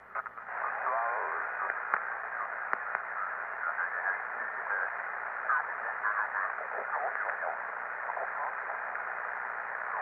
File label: Futz Radio: Radio Static And Warble. Effect Has A Vocal Aspect; Mono